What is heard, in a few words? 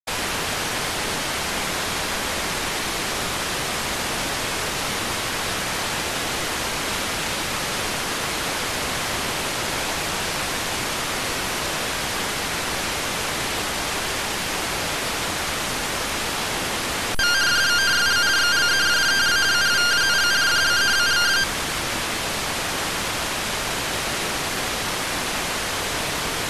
rtty rx